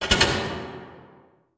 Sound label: door lock